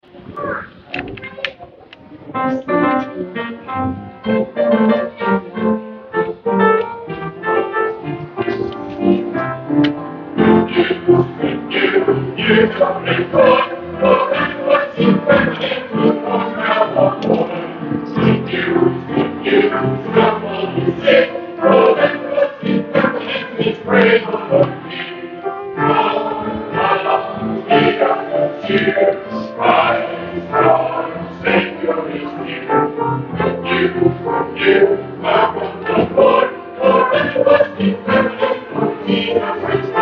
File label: Choir; Christmas Eve Service; Good News Choir